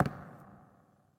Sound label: footsteps barrel; Fate The Cursed King